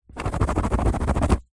office pen ball point scribble scrub out cardboard sheet